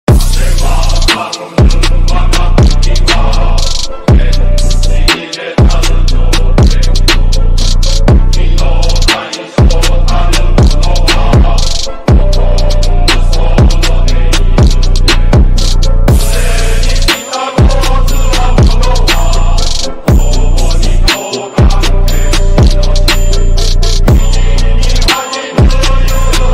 Japanese March song; Drip; Battotai

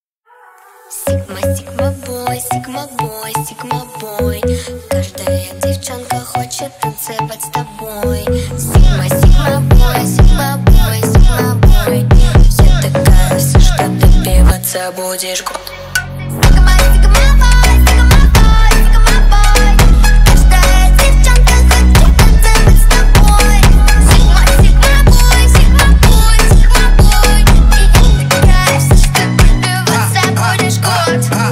PHONK REMIX

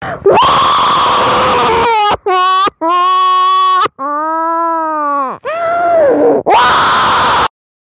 Waaaaaaaaaa crying; Tik Tok

